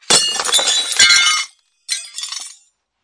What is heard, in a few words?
cheesy glass breaking; Sound FX Real Life